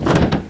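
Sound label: Body fall; Atari HQ Archive #1; Demo Man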